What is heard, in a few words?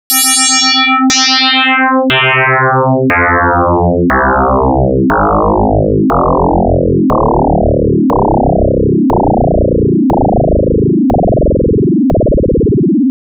modulation down, adlib